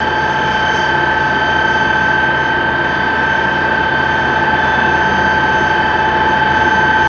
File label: Turbine W; Highwayman